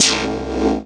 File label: Electro FX; Ueb HE Electro FX